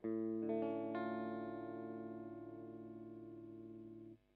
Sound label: Accord Simple